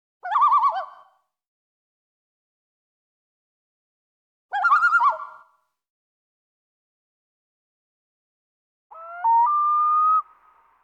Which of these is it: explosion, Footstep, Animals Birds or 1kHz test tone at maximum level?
Animals Birds